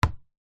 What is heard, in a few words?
handball bounce wood floor single; sport